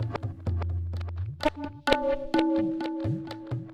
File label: TABLAS; Zero-G; Chemical Beats